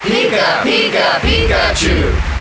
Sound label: Cheer International; Pikachu; SSBM